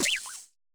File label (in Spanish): Grito de Tarountula